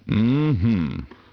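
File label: male happy; People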